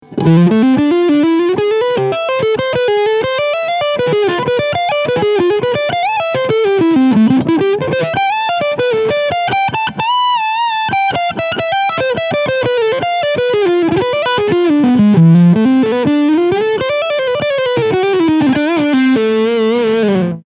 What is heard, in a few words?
JEMJRSP PK Lead Sound Front Middle Pickup; Ibanez